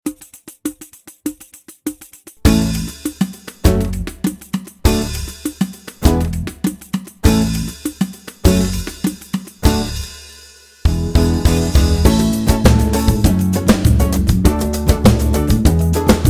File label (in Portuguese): Samba House